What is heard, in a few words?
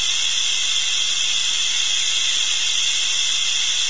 steam leak